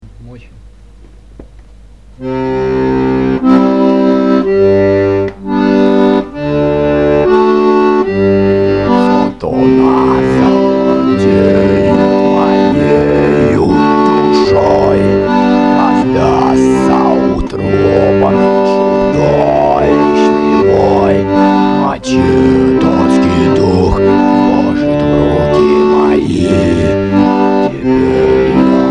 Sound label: resurrection growl